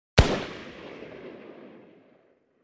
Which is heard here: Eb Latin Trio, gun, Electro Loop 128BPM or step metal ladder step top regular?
gun